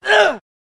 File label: male grunt; soundbible